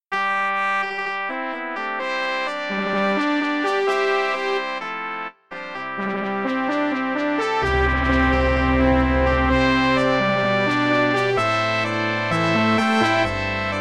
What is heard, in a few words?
brass band